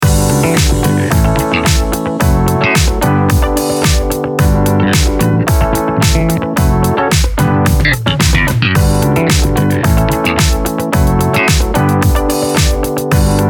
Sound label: DiscoPop